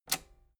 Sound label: metal button robust spring push enable